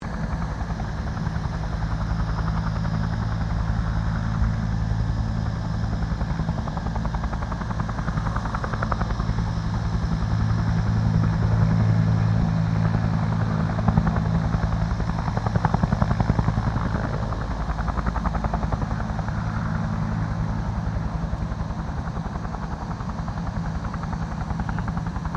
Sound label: HELICOPTER 1967 BELL UH1 IROQUIOS PASS